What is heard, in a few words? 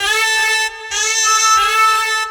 Zurna